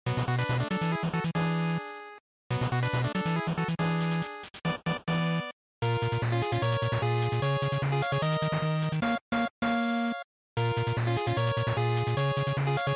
GB Action

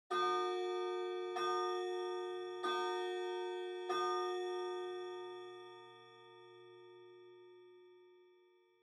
Pendulum Clock Chime Far High

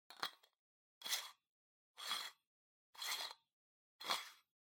Pendant Light Bulb Insert

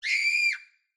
Scream Female

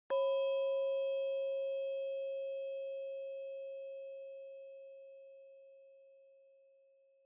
Triangle Single

